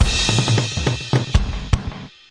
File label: play roll, DRUM LOOPS and BREAKS, FILLS and ROLLS